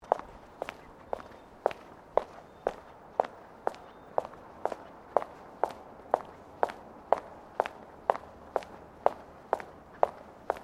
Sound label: FOOTSTEPS WOMENS LOW HEELS PAVERS SEQUENCE; Footstep Foley; Human